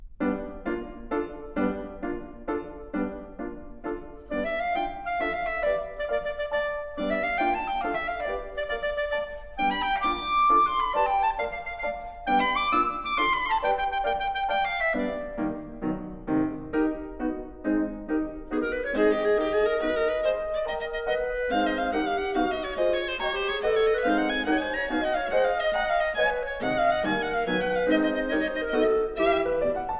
Trio for Clarinet, Viola, and Piano - Presto Assai; Bruch Trio